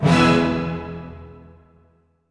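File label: E major, orchestra hits; Northstar - New Gold VOL-2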